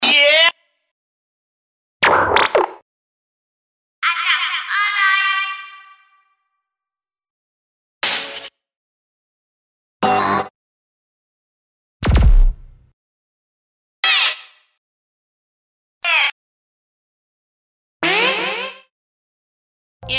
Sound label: cuts n scratches